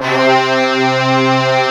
BRASS STAB C2, SYNBRASS; SYNBRASS1; East West; Global Sounds

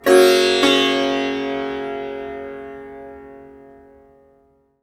SITAR CHORD; SITAR CHORDS

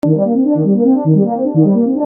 Arpeggio 120 D#, Techno Synth Loops; Sonic Foundry (Sony Creative Software); Signals I

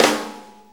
4-4 Snare Flam, Drum Loops

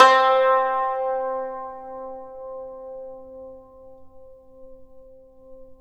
YANGCHIN, C3; INIS - Opium

